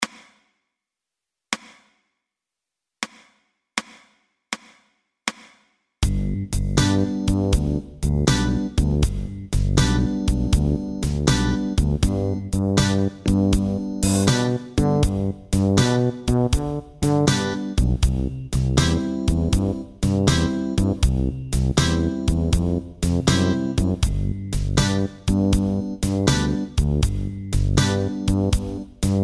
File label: Three String Boogie